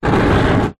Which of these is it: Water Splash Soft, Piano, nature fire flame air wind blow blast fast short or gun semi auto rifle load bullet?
nature fire flame air wind blow blast fast short